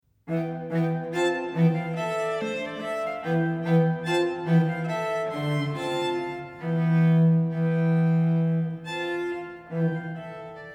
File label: quartet; cage